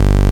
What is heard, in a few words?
Bass Cuts; Vengeance Samples; VES1 Instruments